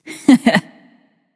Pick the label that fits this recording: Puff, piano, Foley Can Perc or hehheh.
hehheh